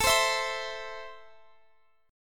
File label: A Minor, Guitar, Drop D